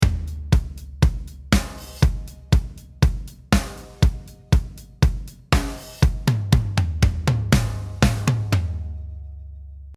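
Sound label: Drums bus compressor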